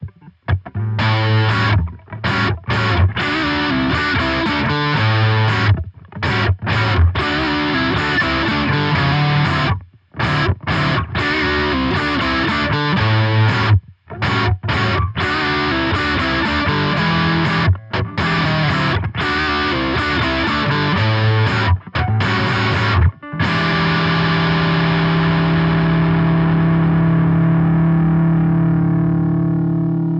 gitarre chuggin blues; presonus ampire xt metal pack test

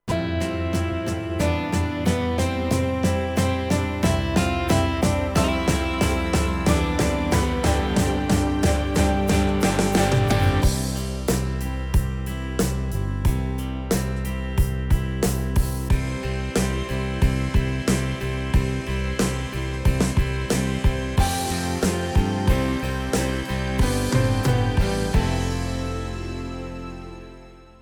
yamaha tyros 5 styles rock schlager

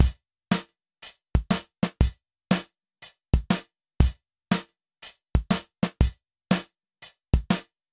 drums mit gate; softube valley people dyna-mite

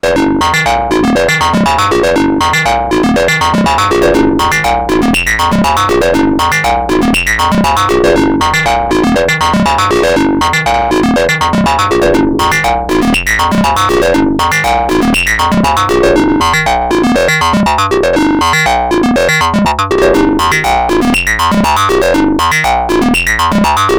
ARP Odyssey test ringmodulation; korg collection

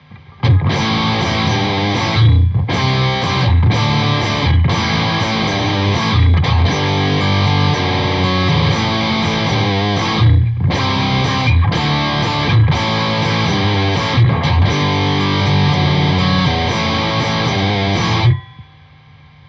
gitarre metallary; presonus ampire xt metal pack test

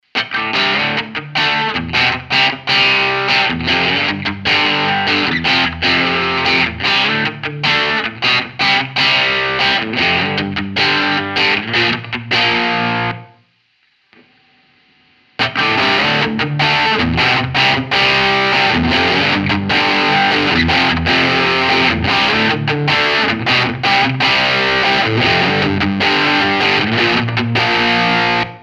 Le Crunch Kanal AB hot Strat Steg PU erst nur B dann AB; two notes; wall of sound iii

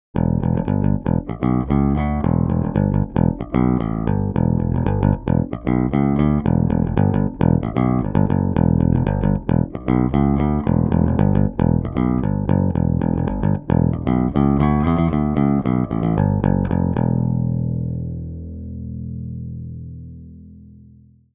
jazz bass plektrum line; phil jones double four bg-75

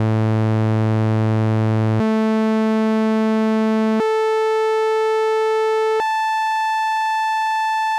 oszillator saegezahn

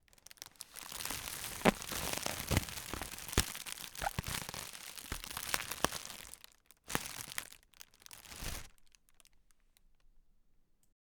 rode nt-usb plastik

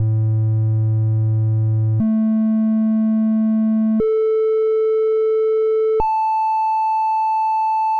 oszillator dreieck

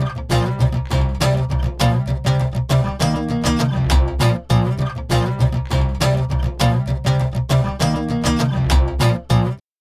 gitarre; softube summit audio grand channel

